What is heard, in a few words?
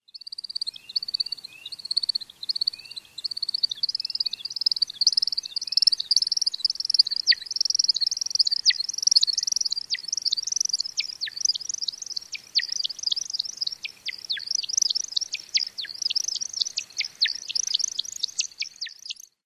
display flight twitter